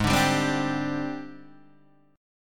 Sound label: Ab Major 7th Flat 5th Ab, down, Guitar, Open D